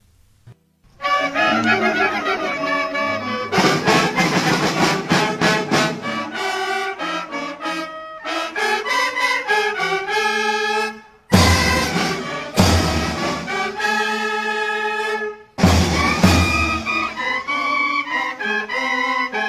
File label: anthem